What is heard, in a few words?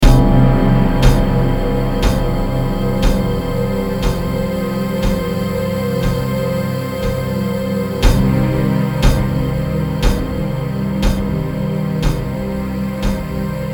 War Drums; Track 1 War Drums